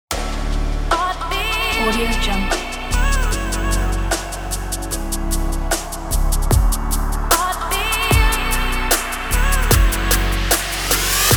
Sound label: future bass